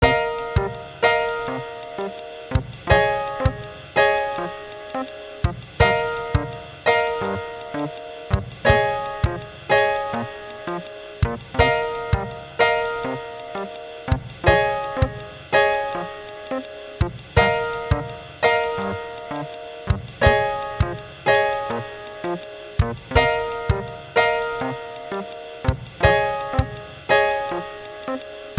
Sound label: brass, Scale Practice Tracks